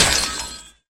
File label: Ice Damage, Ice Blocks; fowl